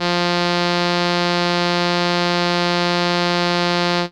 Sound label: Trumpet F3, ARP Solina Unmodulated; musicradar-synth-samples